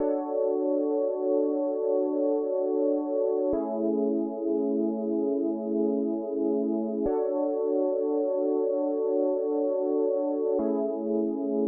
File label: 68 BPM A Maj Synth; Melodies; Loop Stems; Cymatics; GHOST (Beta Pack); Avenue